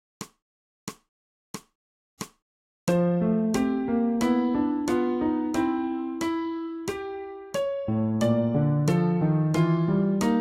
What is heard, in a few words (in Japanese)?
新フレットレス